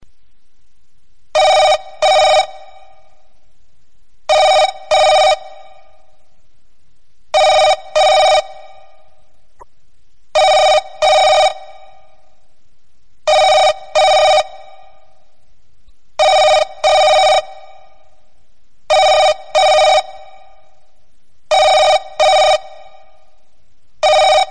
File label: tring tring tring